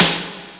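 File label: ASIAN SD, snare drum; Crypton - DrumBase5000; CD DRUMS #2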